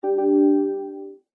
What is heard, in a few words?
time warning; poker game